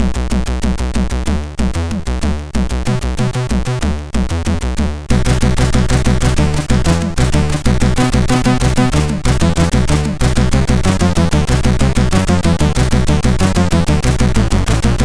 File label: Short Techno,sid MOS6581R4